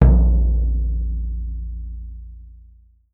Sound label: MD METAL, percussion; Voice Crystal - Steve Reid Definitive Percussion; BRAZIL SET